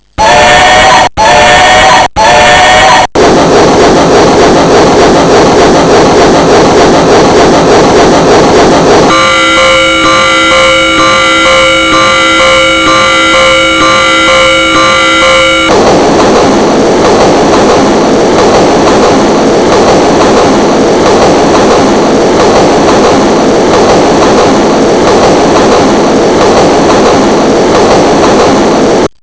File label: train